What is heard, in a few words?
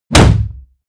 hit shield wood; hits impacts